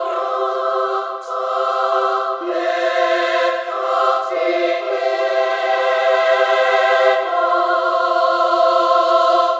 Blackthirst Choir, orchestral

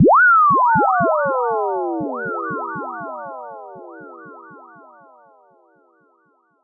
Bubbling, electro dub

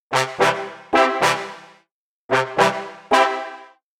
Trombone Stacc, brass

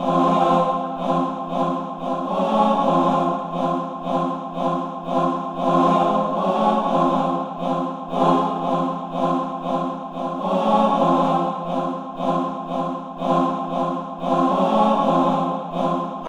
Choir; 118 bpm

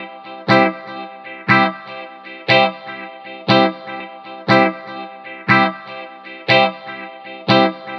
Off Beat, electro dub

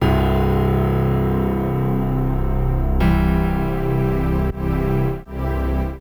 Pia String; downbeat

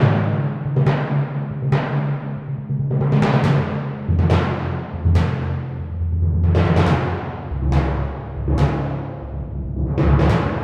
timpani; напряжение

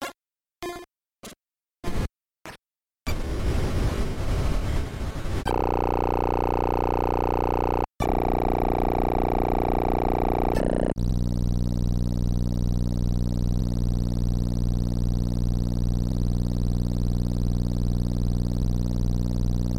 sound effects; jurassic park

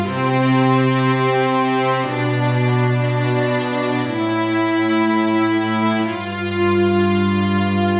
Cello Legato B

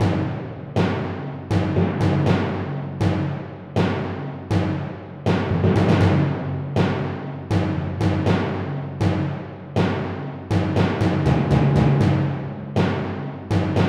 timpani

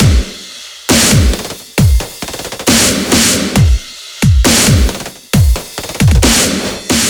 Smash Kit; Dubstep

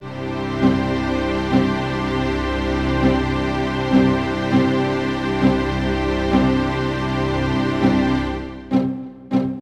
Heroic, strings; рок поп; strings сэмплы

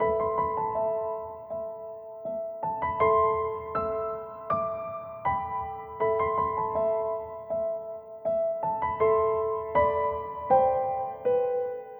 Grand Piano; фортепиано

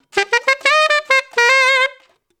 funk solo F, духовые

